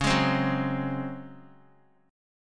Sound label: Db Major 7th Suspended 4th, Guitar; x,4,6,5,6,x; 4E,4A,5D,5G,5C,6F; strummed; chord shape